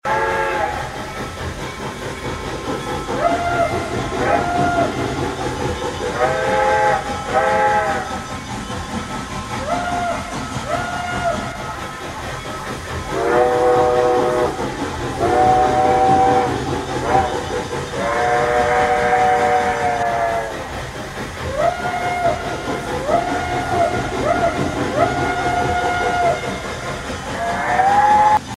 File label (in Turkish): Tren Sesi